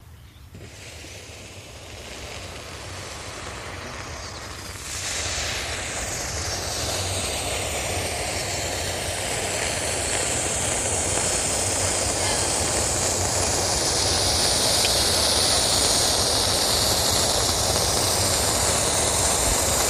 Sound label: Street Flare, Ignite, Burn, and Hiss, Tail Fade